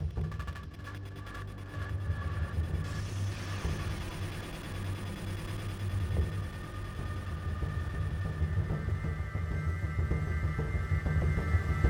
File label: Music Boss Ending; Sifu; Boss Hideout5